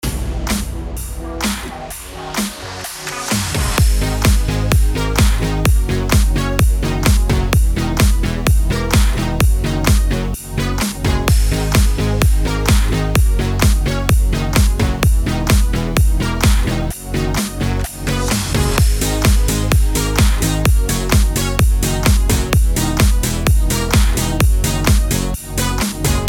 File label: BED ELECTRO G#MIN 128BPM